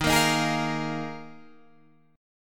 D sharp Suspended 2nd Suspended 4th D sharp Guitar DADGAD